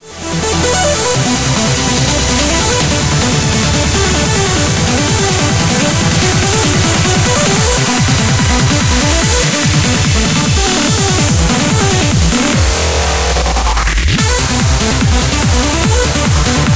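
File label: background music crossfade; Invaxion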